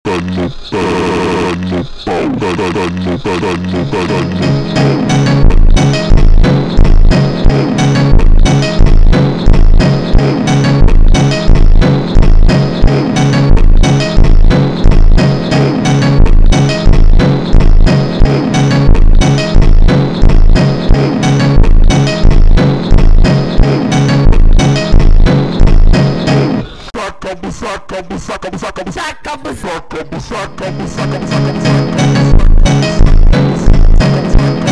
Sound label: Baixo slowed, funk